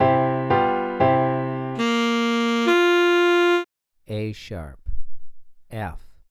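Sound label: Alto Sax 2 Note Melodic Major a#2 f3; ear training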